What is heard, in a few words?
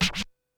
SHORT SCRA, Vinyl Scratches